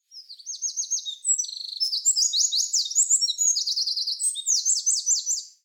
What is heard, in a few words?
troglodytes troglodytes twitter, animal